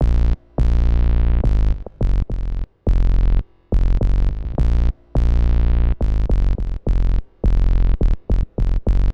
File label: HipHop Synthbass D#Min; Digidesign; Pro Tools Essential Loops